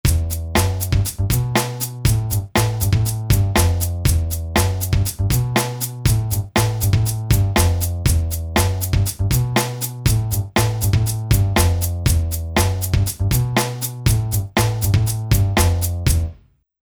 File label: Rock